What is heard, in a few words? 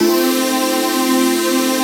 ATMO PAD, Dance Mega Jungle Rave; Best Service